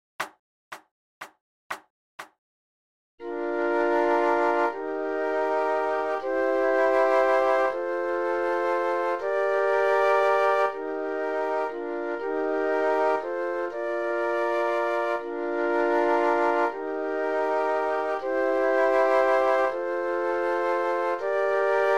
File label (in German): Floeten